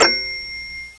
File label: Phone Hit By Bee Bee Bell 8 Bit; abbottabad compound